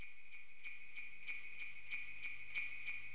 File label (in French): cloches